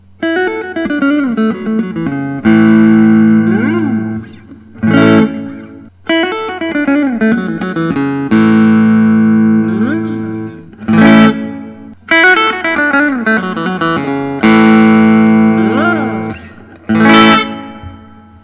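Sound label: spirit series 52 butterscotch, guitar; detemple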